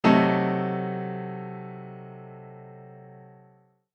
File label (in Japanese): ガーン; 場面切り替えや心理描写; 演出効果音